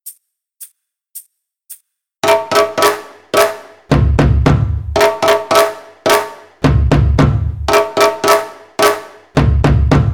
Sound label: Thunder Drum